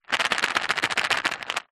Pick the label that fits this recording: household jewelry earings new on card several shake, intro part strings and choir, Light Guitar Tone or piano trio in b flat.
household jewelry earings new on card several shake